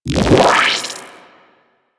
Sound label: Pirate Cast; Warframe; Warframes